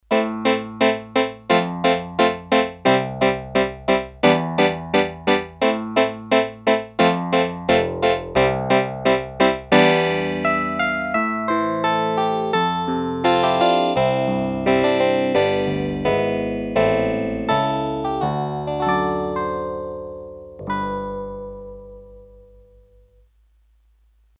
Piano, Korg DS-8; Factory Patches

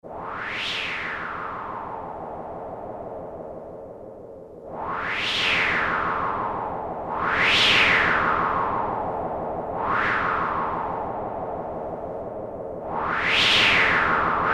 Typhoon Sound; Tones

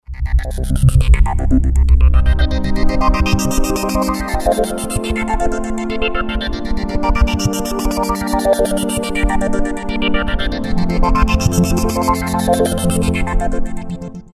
Pulsatronic, Roland JV-2080

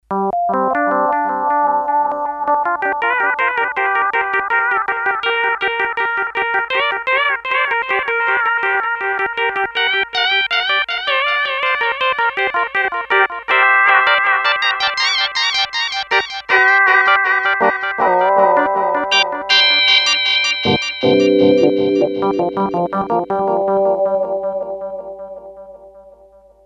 Synth Lead, Korg DS-8; Factory Patches

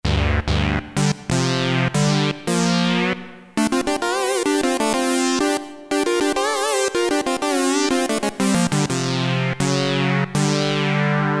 UP FOURTH, Roland Jupiter-8, Factory patches

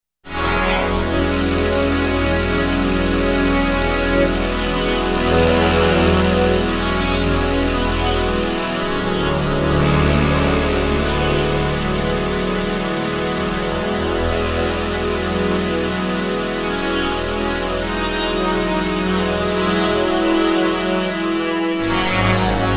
Bowed PPG

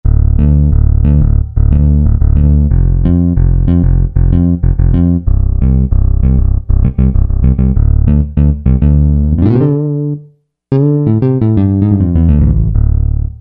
Synth Bass, Korg M1; Factory preset examples

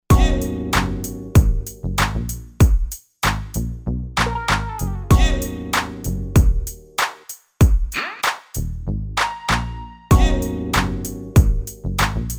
STONEXL, AKAI XR20 Factory patterns

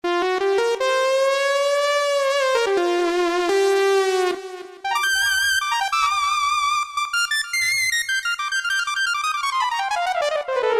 Synth Lead, Roland PN-D50; Roland PN-D50-01

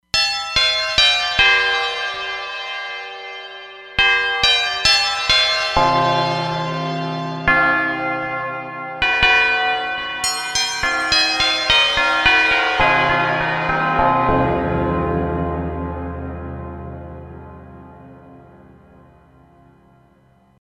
Chime

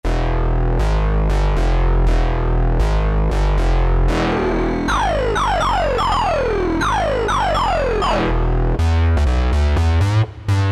Wild FM; Vintage Synthesizers And Classic Beatboxes

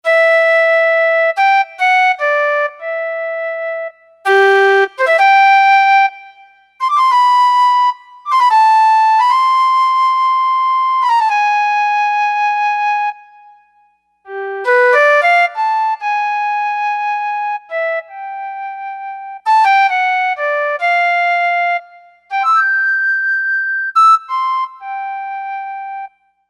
Flute

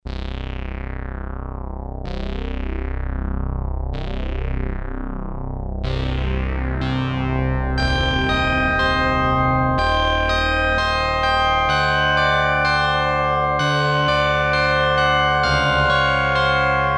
Moon Sound, Korg PolySix; Factory Presets Bank A

